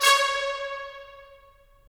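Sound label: brass trumpets staccato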